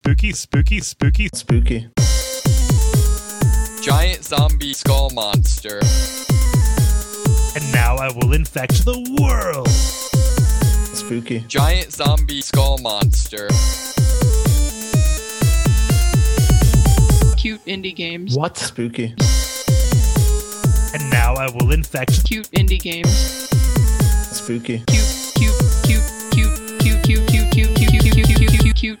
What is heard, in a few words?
level spooky supercut ringtone